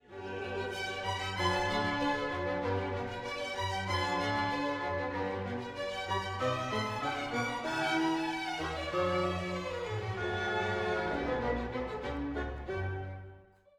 Quoniam Orch break

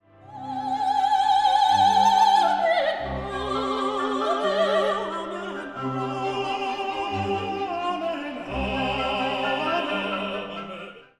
Gloria Amen cascading

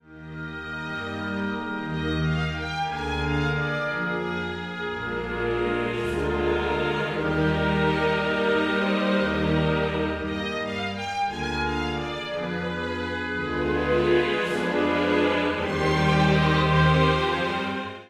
Agnus Dei Chorus strings

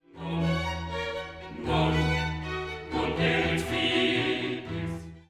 Credo Non non

